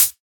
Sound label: Electronic Closed High Hat